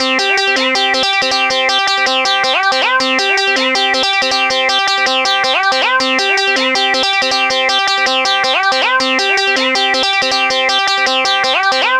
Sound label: Dance Synths, Hardcore Loops, Synth Loops